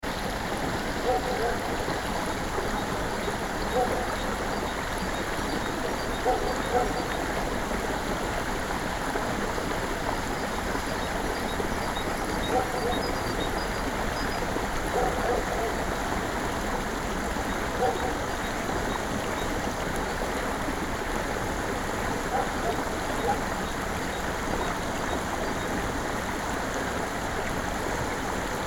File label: river, pond; kopninka river; banny pond; sergiyev posad